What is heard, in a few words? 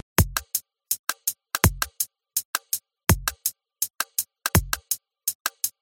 beat